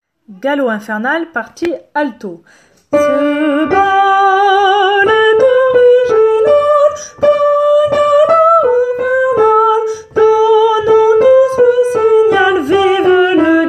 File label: alto